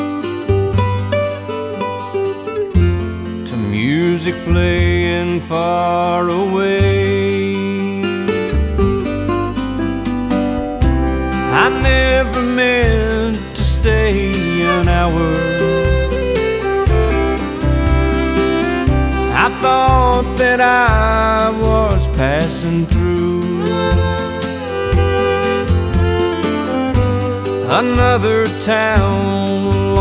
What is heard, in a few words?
country